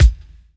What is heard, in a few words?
reverb bass drum single hit b key